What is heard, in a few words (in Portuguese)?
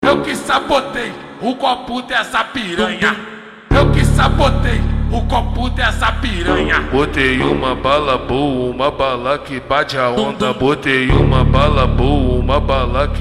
funk carioca; serralheria alto estilo e rodrigo distribuidora de bebidas